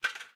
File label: button Click; cashomatic